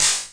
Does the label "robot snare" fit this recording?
Yes